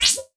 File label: Multimedia Internet CD-Rom Flash Pop Up Short